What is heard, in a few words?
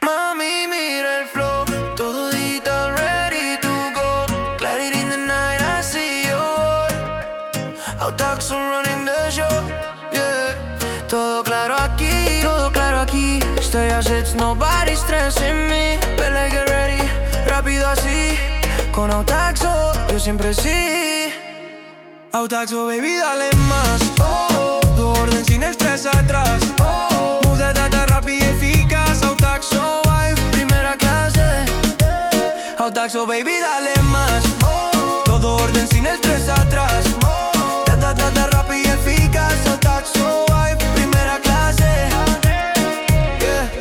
reggaeton smooth